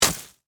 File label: Snow Crunch Icy, Grass; Weather; Snow (Sound Effects:Weather); BlastWave